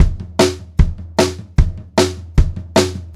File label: 152 SP TOM, Pop-Rock Drumloops; USB Soundscan vol.37; AKAI; 90 s Sample CDs; 152 SPEED